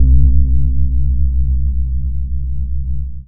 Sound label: Single Electric Basses, Dance Floor Bass